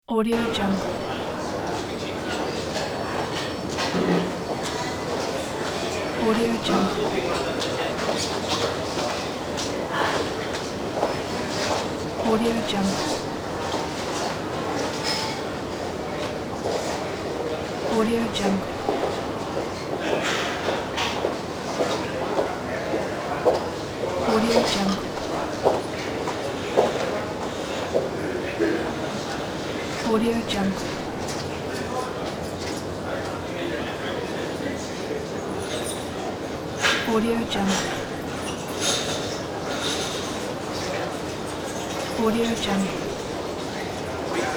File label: hospital ambience